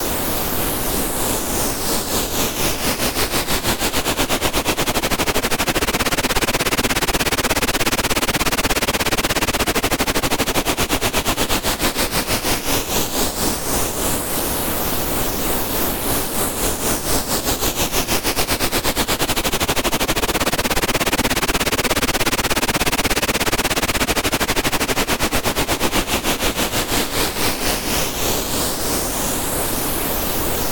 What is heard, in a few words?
Boomerang